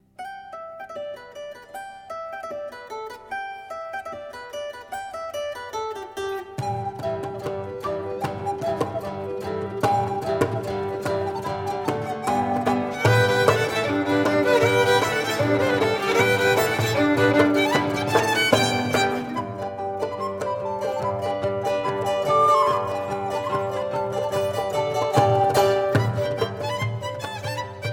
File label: John Ryans Polka, Zither